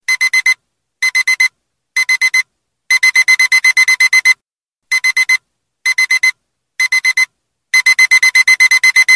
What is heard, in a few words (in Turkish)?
Alarm Sesleri; zilsesiindir